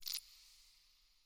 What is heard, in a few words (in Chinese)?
眼眸花开放声